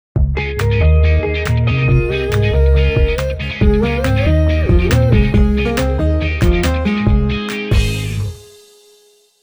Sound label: Jingle